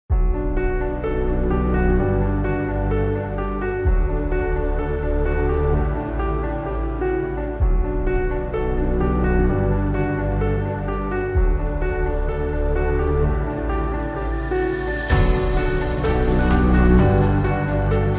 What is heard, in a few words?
Uplifting Inspirational Cinematic